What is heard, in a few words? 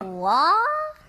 Waa girl